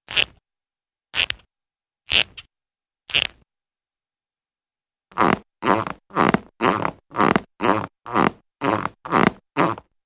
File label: Creaky Footsteps Or Short Twisting Creaks; Hollywood Edge Cartoon Trax